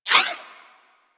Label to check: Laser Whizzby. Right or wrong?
Right